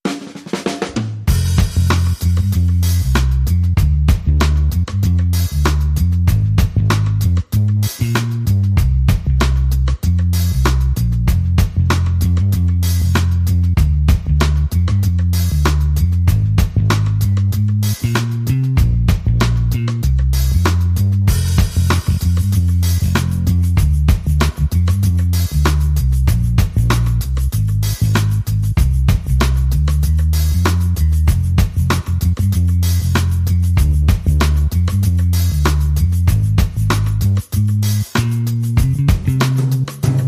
Drums and Bass Mix

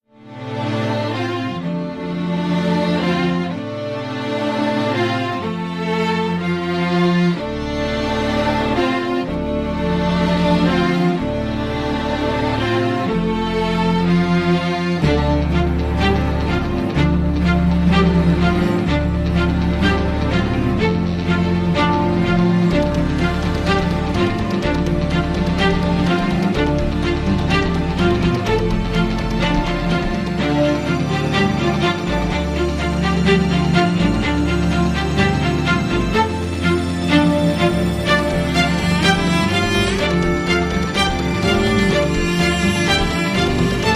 Fast Lane Strings No Drums

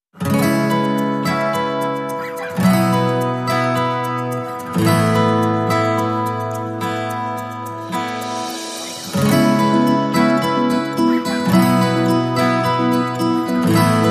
Bright Acoustic Days

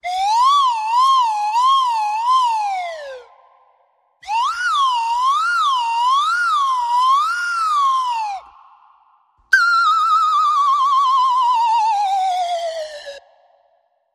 Dizzy Whistle

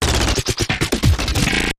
Sputter Shots

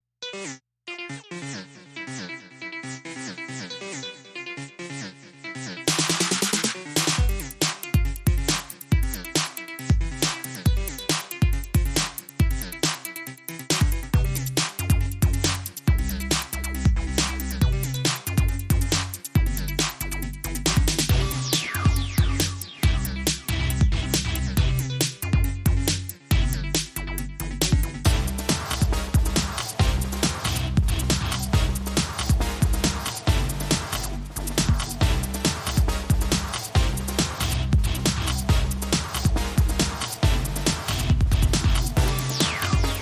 Inst

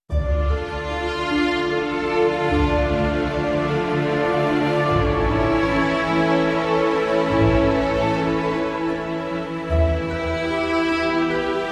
No Melody

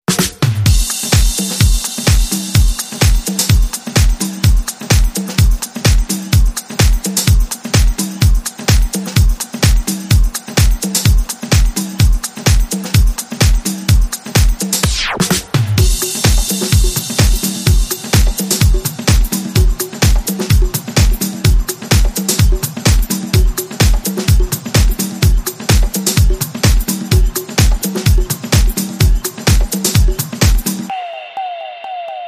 Disco Vibe Beat

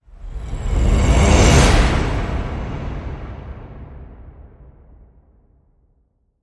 Vocal Fury Growl Whoosh

